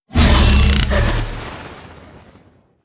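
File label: grenade explosion digital; weapons; Half Life Alyx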